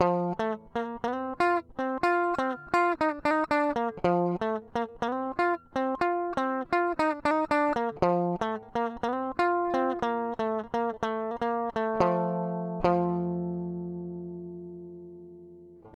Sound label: Gtr 120bpm F